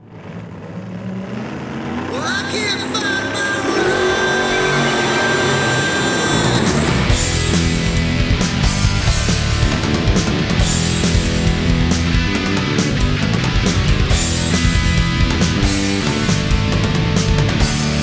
harmony karaoke

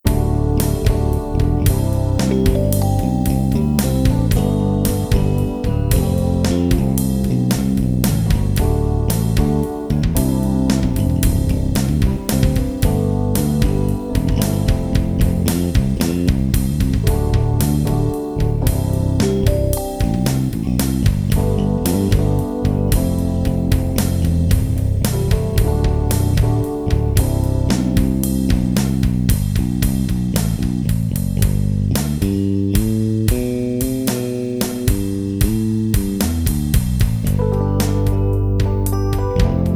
male keyboards bass; music smorgasbord

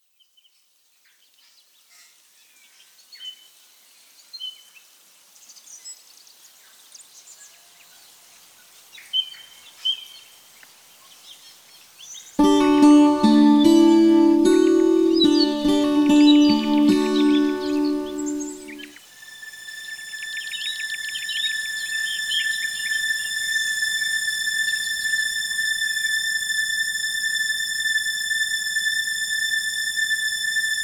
strings; ambiance; music smorgasbord

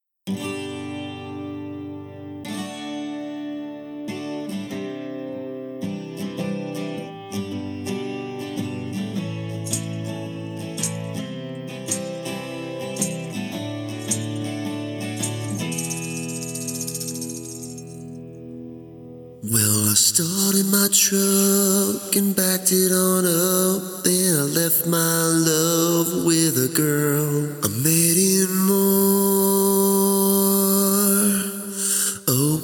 vocals lead; music smorgasbord

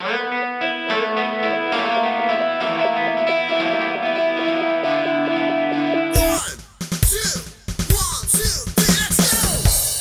vocals; music smorgasbord

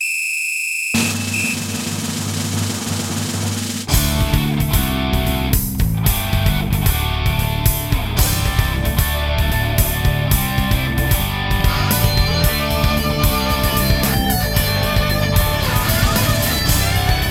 electric harmony karaoke full mix

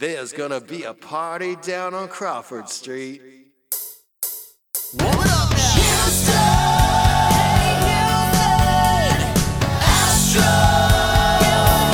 acoustic; music smorgasbord

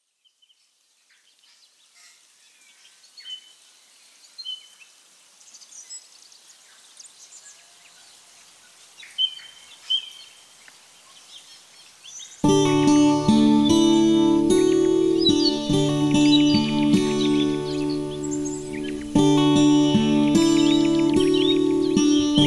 ambiance rhodes

